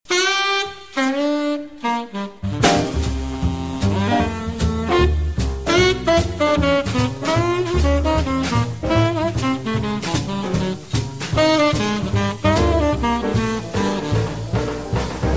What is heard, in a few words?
Changes